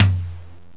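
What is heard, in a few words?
hi floor tom, drums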